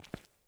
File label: FOOTSTEP Asphalt Trainers Walk Slow mono; Phasmophobia